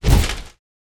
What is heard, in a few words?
wood door tear